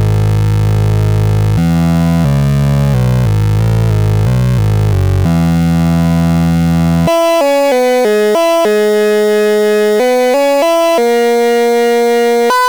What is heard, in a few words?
cyclebox tri sine